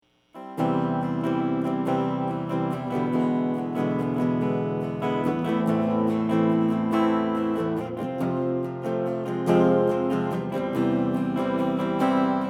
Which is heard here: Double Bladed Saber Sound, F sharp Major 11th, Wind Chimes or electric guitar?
electric guitar